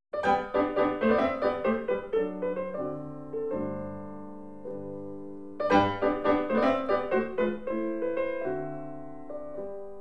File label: Polka, Piano; Wagner